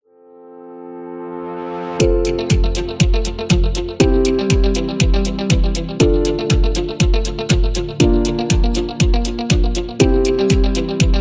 Elegance

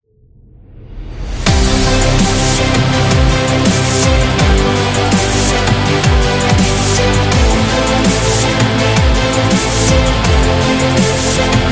Epic Sports; self motivation

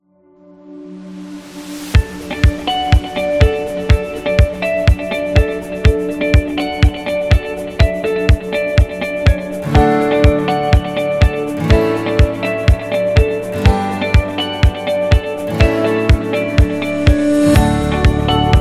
Arcade; agency promo

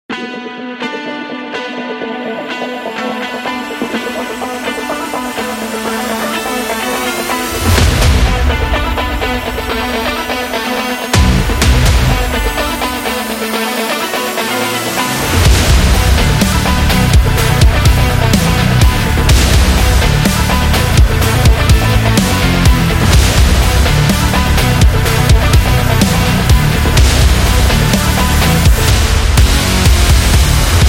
Rock Power; sport rock

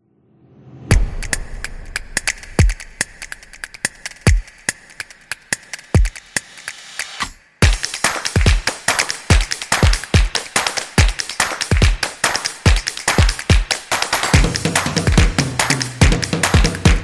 Fun Dance

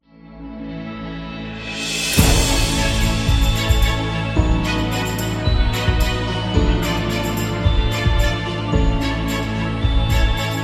Award Ceremony Music; self motivation

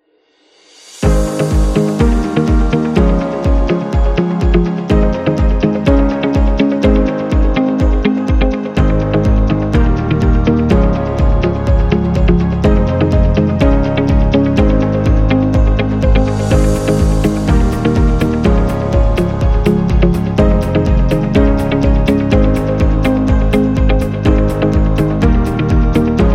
Upbeat Background; technology

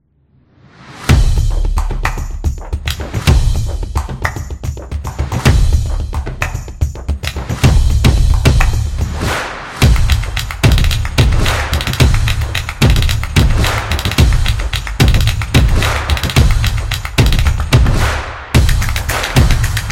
Sport Game, football music

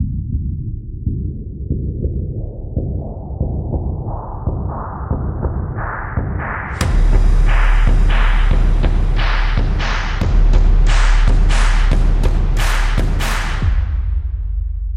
Hunting, football music

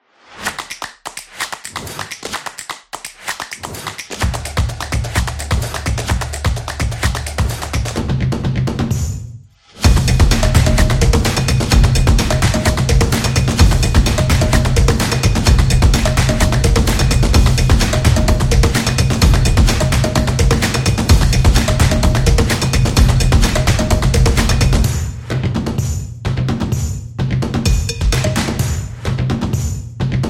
Claps Drums Stomps; percussive nation vol 7

